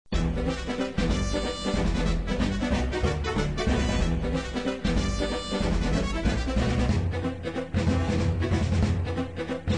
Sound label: music; TOTEM MULTIBALL